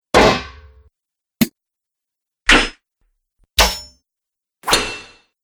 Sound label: Sword And Weapon Hits; 5 Sword And Weapon Hits - Pack 4